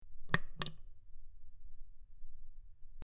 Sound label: Button Press In Out Sp, switch clicks